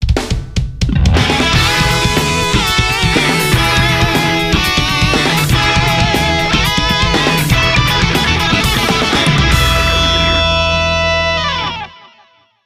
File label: Start; Gran Turismo 5